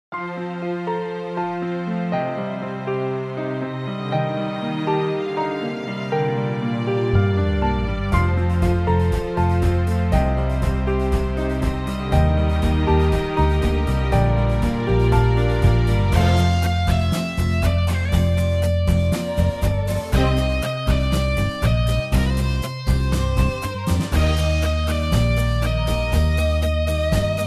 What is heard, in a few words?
rock